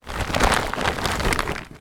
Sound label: tarpaulin movement; foley; zapsplat